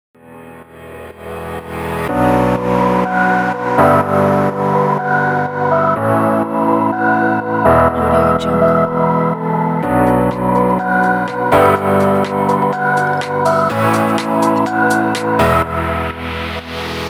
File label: uplifting pop fashion corporate